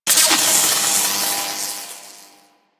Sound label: Slam Electricity; Warframe; The New War; Teshin Intro